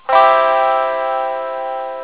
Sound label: Sound Guitar Chord C